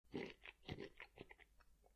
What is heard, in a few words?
eating